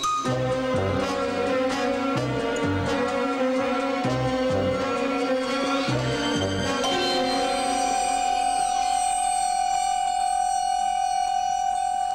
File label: bond melody